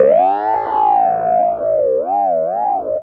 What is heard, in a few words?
Clavinet; Ambient Grooves